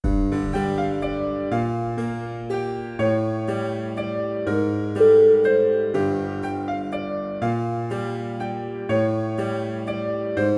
zombie waltz

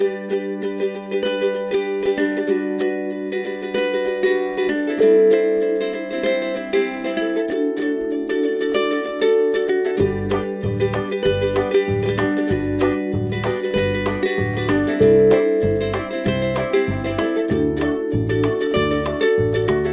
happiness is a ukulele

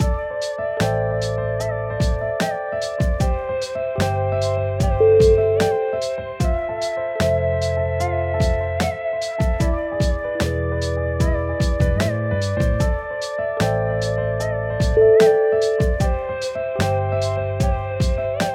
lofi memories

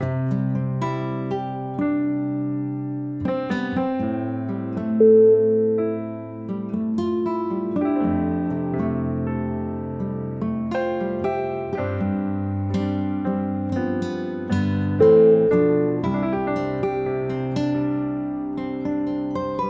warm guitar

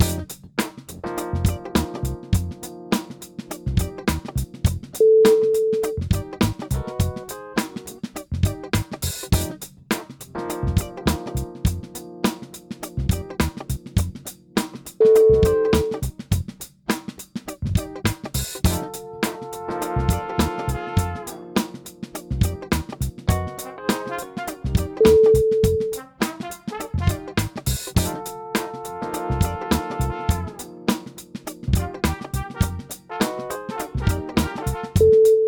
funky moves

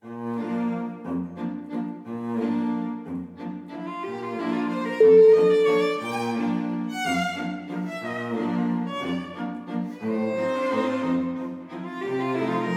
voices of spring waltz

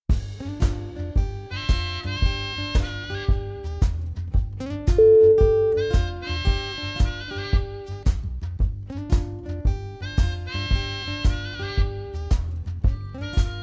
good old blues

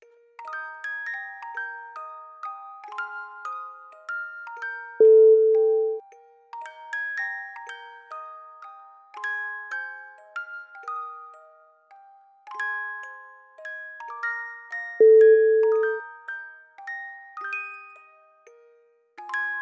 classical lullaby theme

